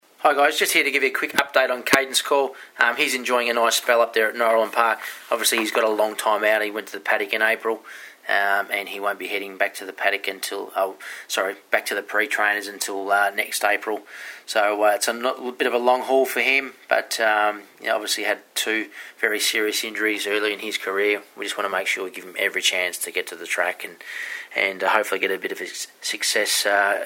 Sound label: Cadence Call